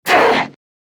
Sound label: Pain Grineer Manic; Battle Barks